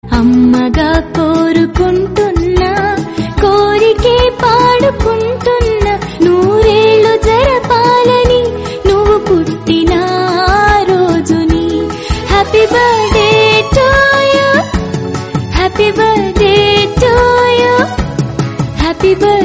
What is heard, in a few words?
birthday song, telugu